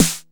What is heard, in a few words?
909 SD, TR-909; Best Service Dance Mega House Dance